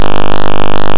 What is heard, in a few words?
engine fast, speaker pulses (~16.6 kHz); Called once per interrupt (~50 Hz); each call plays 8 speaker pulses (~16.6 kHz); River Raid; when the player holds up to accelerate